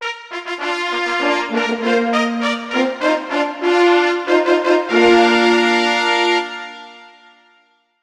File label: Player Fanfare; PBR